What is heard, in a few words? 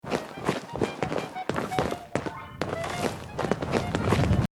footsteps stone; fallout 4